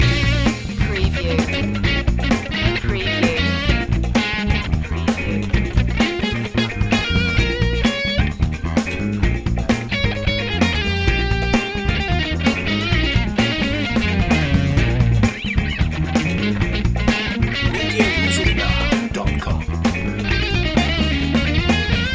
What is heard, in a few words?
busy funkster loop